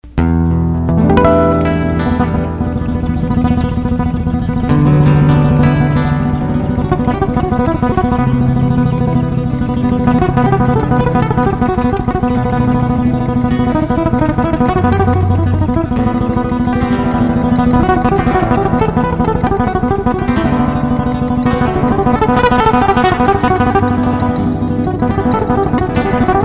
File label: Intro Flamenco